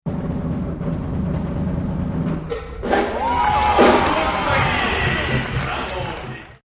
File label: Circus Drum Roll; Amusement Parks; Backgrounds; Partners In Rhyme